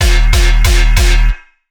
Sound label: gun attempt